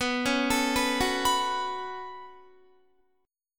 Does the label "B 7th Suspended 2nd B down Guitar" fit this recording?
Yes